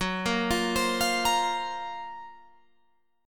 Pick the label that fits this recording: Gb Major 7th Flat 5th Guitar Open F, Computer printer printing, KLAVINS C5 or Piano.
Gb Major 7th Flat 5th Guitar Open F